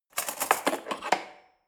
cutlery fork drawer handle scrape

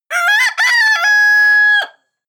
cock call close

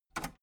metal lever button radio enable fast

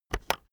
keyboard external enter press fast

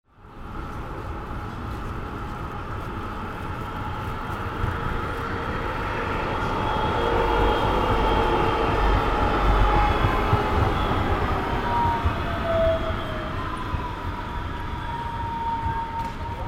tram passing

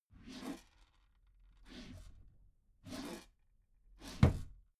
desk drawer open close

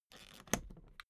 suitcase pull down latch lock hard

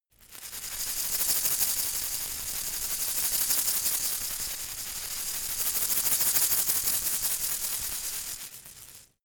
insect shaker rattle dynamic

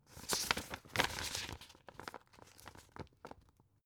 old examine

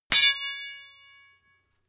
sword impact metal ring heavy

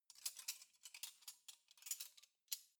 cutlery knives handle long

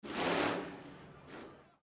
55er chevy

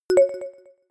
notification short bell

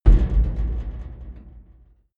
big metal impact hard punch

